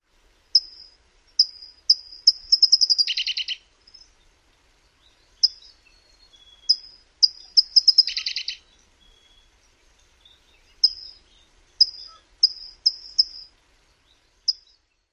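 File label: CALIFORNIA TOWHEE songs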